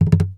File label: MARTIN AC, Bass; Sampleheads; Will Lee Bass Library; MARTIN AC 4